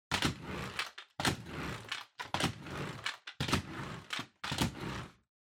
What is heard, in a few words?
Vacuum Cleaner Head Move